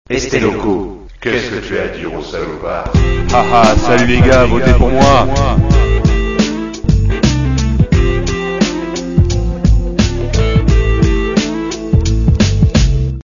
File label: jingle